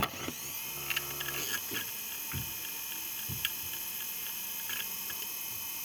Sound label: ibm travelstar 20gn ibm djsa 210 bootup